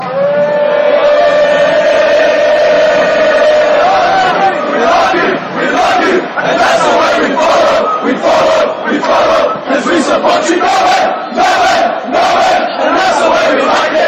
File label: fan chants; melbourne love